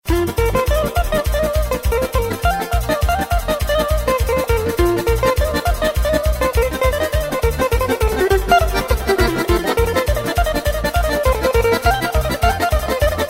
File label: new metal style, ringtone